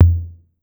Tom 808 Lo, Clavia DDrum AT, Pitched Down

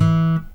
ACSSFTFNGR, Guitar

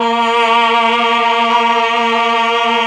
CHOR, CHOR+OBOE; ILIO; Propeller Island Legendary M400

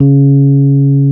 C#2, Electric Pianos; Wizoo Electric Pianos

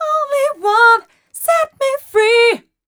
f mel e flat, fem Vocals

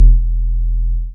BAS MINI, Mini-Moog; Sound & Vision - Gigapack II CD 1

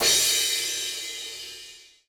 Crash; Equipped Music; Loungin House

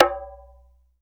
SABARR, AFRICAN PERC; Greytsounds VOL-1

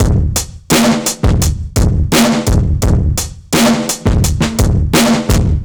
Old Drum, 85bpm, analogue circuit; musicradar

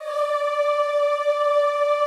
D4 R, Classical Choir; Best Service; Extended Classical Choir

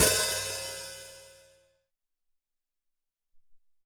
Br Hat Md, Alesis SR-18

